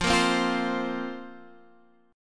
F Suspended 4th F down Guitar Half Step Down

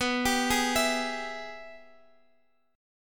Ab Minor Major 7th Sharp 5th, B

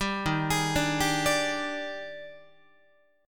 Eb Major 11th, Guitar; EbM11; arpeggiated; JGuitar

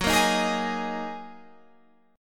F sharp 6th Add 9th F sharp Guitar Half Step Down

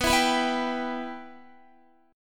B Suspended 4th Sharp 5th B Guitar; Bsus4#5; strummed; x,14,14,16,16,14

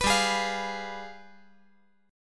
G 7th Flat 9th

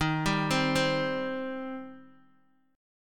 Eb 6th Eb, Guitar Open D; down

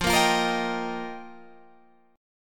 G Minor 7th Sharp 5th, F

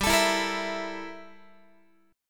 G Minor 6th Add 9th G down Guitar

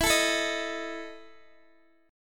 E Major 7th Suspended 4th E down Guitar DADGAD

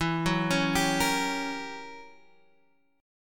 Gb 7th Flat 9th E, guitar

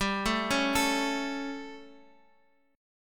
G Suspended 2nd Flat 5th; arpeggiated; x,x,5,2,2,5

